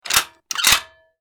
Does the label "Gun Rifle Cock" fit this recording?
Yes